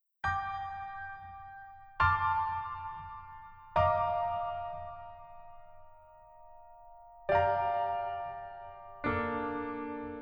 Piano ver 멜로디MR

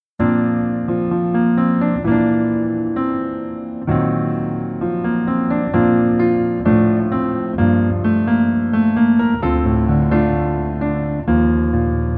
Piano ver -1 키

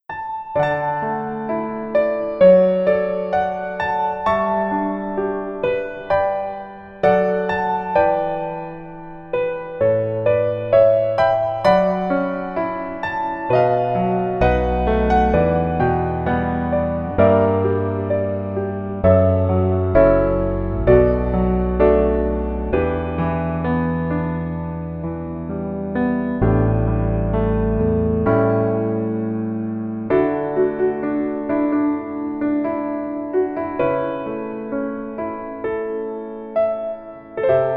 Piano ver 멜로디MR -1키

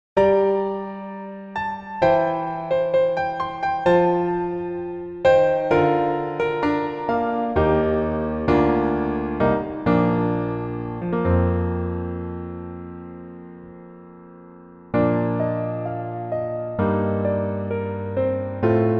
Piano MR 멜로디MR

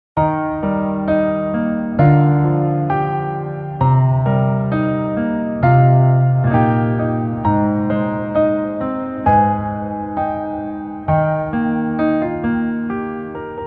Piano ver 멜로디MR 여자키

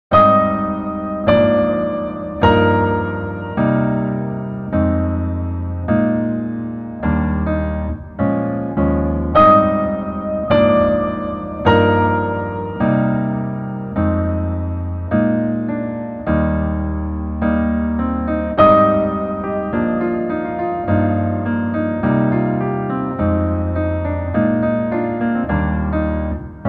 Piano ver, 멜로디MR, -1키

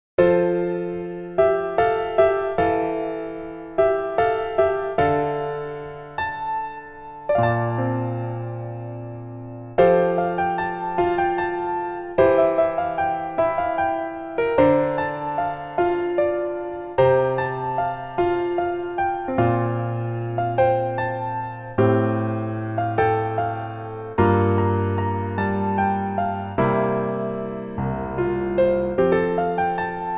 Piano ver, 멜로디MR

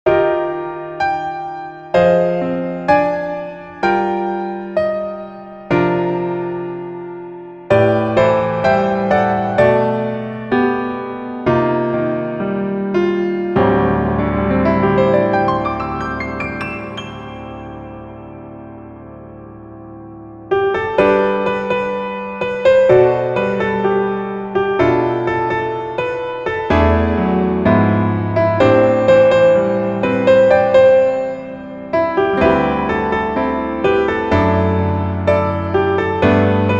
Piano Ver 멜로디MR

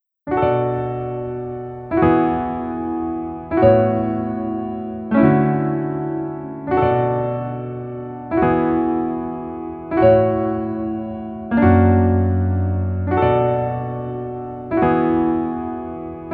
Piano ver, -1키

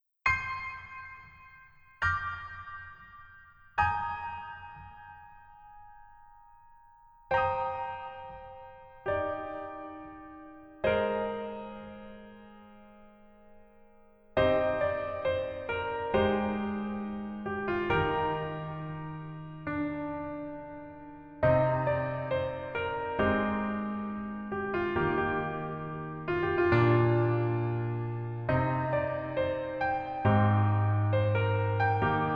Piano ver 멜로디MR 여자키

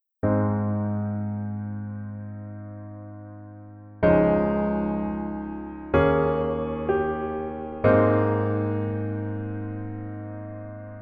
Piano ver -1키

